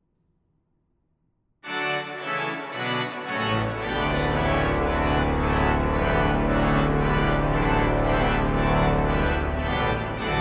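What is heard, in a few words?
Young Grand Choeur, organ; laurentius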